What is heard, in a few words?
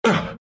grunts, ru